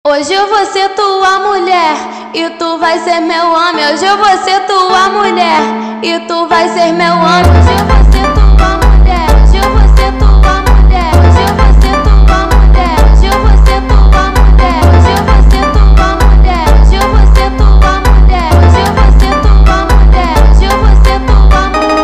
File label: Funk